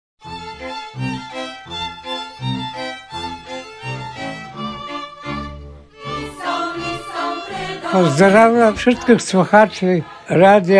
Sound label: jingle; maestro; yuvilej maestra yaroslava trokhanovskoho